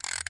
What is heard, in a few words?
Spike Rotate In; Cut the Rope